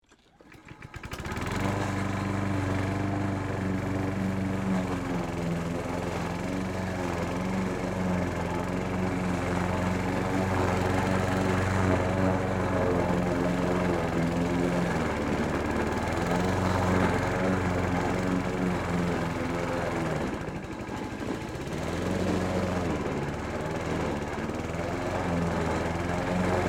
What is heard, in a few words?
Lawn Mower Run, Household, Lawn, Mower, On, Run, Off, Rough, Terrain; Rocks